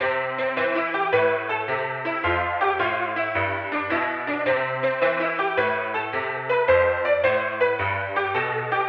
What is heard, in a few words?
old saloon piano